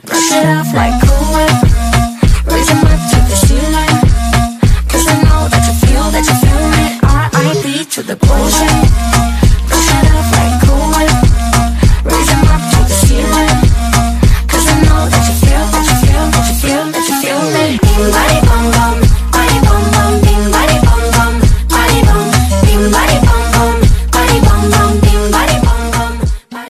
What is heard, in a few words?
game english music ringtone